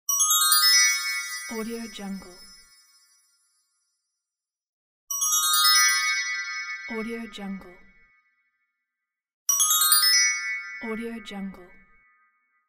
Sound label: magic wand spell